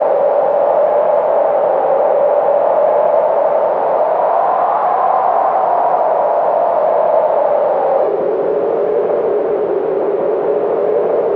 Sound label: Blizzard